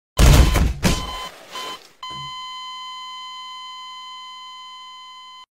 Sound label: death sound effect; gmod